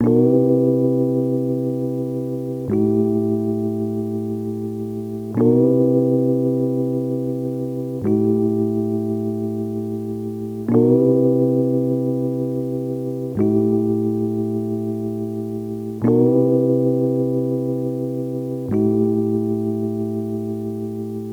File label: E Piano - 90 BPM A Min; Melodics; Cymatics; Eternity Sample Pack